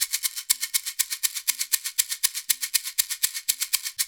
AFRO, African Contemporary, AfroTriangle

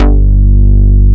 LOOP, Synth Bass, Roland

